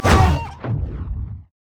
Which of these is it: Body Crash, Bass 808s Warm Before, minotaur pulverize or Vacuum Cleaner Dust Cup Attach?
minotaur pulverize